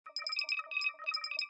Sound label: typing